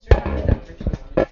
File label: creak, tuba